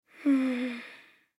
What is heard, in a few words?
Human Sigh Bored, Sigh Bored Male Ten Yrs Boy; Sighing; Voice; Blastwave